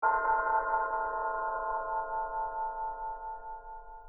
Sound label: bells test